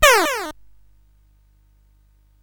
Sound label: Paddle Mania, CSG8580R5